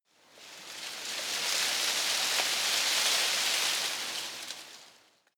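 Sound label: tree foliage rustle soft, garten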